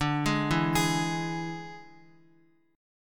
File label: D Suspended 2nd Flat 5th D Guitar; Listen to Dsus2b5 arpeggiated; JGuitar